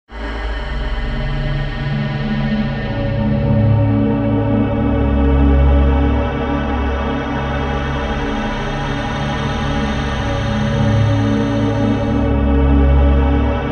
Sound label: timewind pad long; sphera